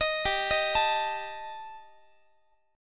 G Suspended 2nd Sharp 5th G, Guitar, 4A, 4E, 5A, 5D, 5G sharp, 6C sharp; arpeggiated; x,x,18,17,19,20